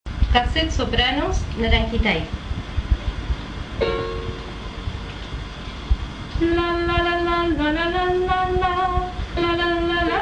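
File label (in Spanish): Sopranos, MIDI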